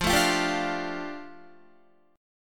F 6th Add 9th F down Guitar, chord; F6add9 strummed

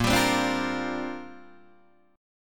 A sharp 6th Add 9th, Guitar Open D

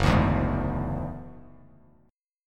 Gb 7th Suspended 2nd Sharp 5th, Bass Standard; strummed; JGuitar

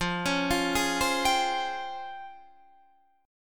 C sharp Major Sharp 11th F

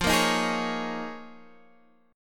Eb 7th Suspended 2nd Suspended 4th F

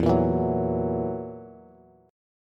E Suspended 2nd Flat 5th E down Bass

